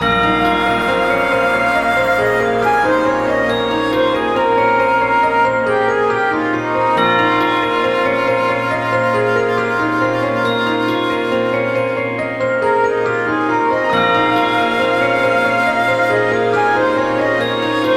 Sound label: Whisper loop